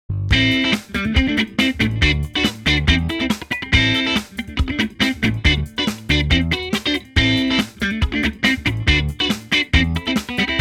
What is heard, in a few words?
ampsim test clean; cubase